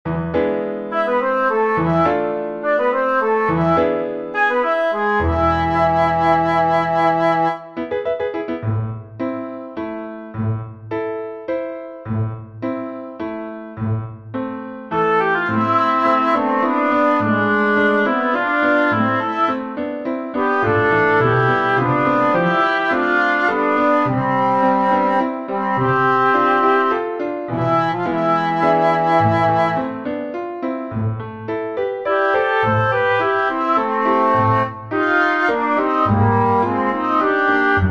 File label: pno